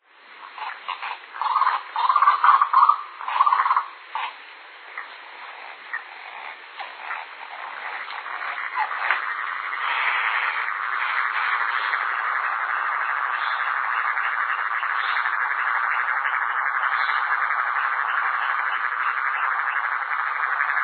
anu-coroca

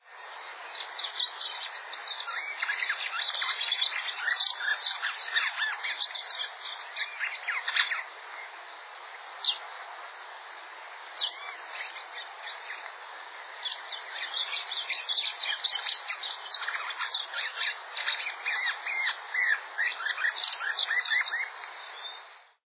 coleira-de-garganta-branca

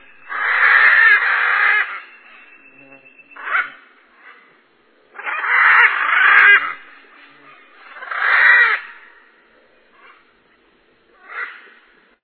arara caninde

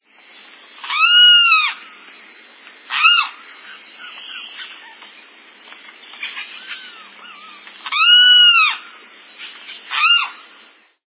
carao

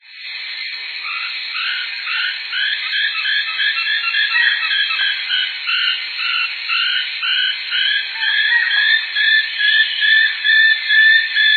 arapacu-rabudo

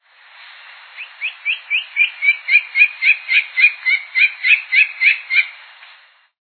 freirinha-de-coroa-castanha; voegel brasiliens

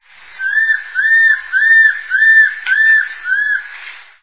papa formigas barrado

